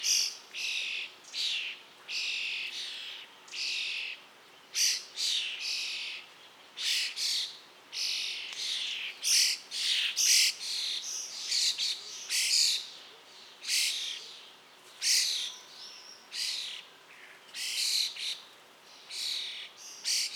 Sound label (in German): Vogelstimme Star, Common Starling, Sturnus vulgaris vulgaris; Vogelstimmen